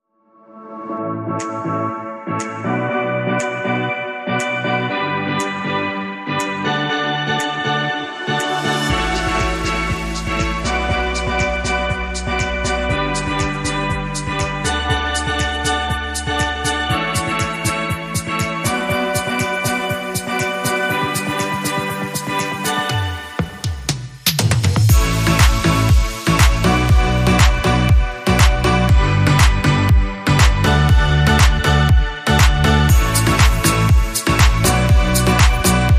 Happy Crowd, Rhythm; 60sec

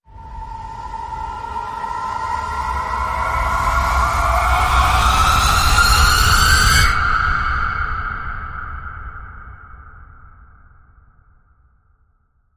Harpy Screech Rise

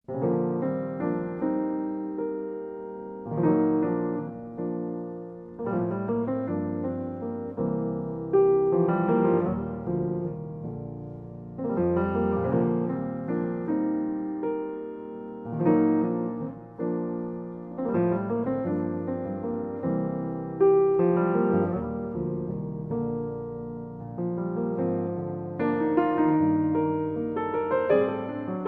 Solo Piano

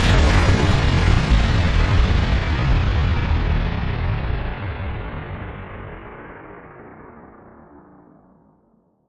Big Boomer Impact And Buzz

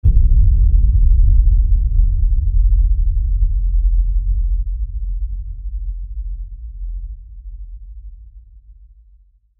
Pillow Boom

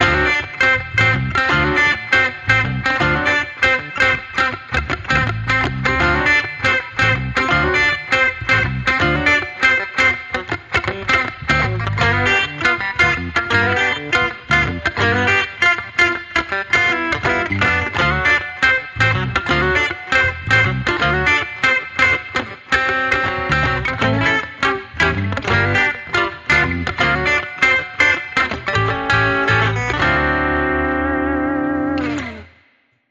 Electric Redneck, No Drums

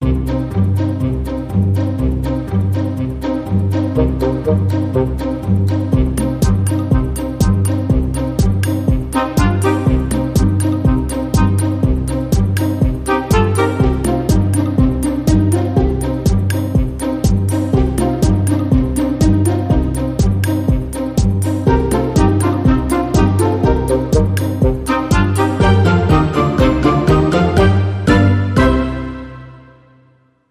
Modern Percussion

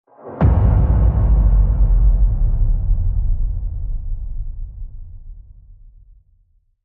Whoosh Hit

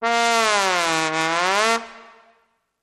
Trombone Slow Gliss Down and Up

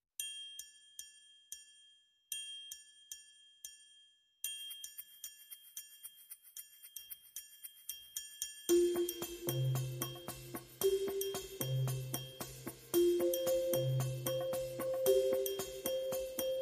Urban Life

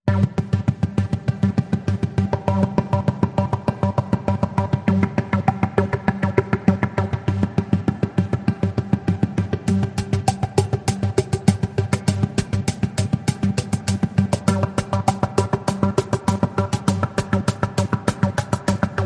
Perc and Pulses Only

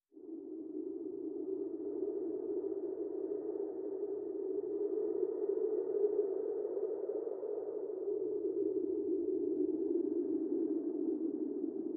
Wind SFX Only

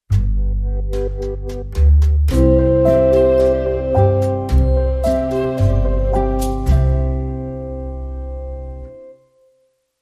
Alpine Romantic, Sting Reduced

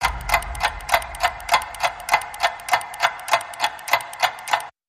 Pendulum Tick

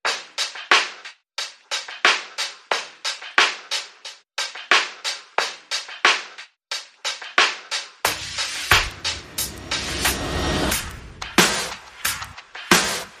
Perc and FX Only

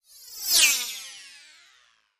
Sharp Slicing Sweep

